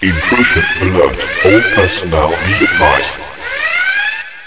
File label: ALERT; Total Chaos Nukem 3d Pack; duke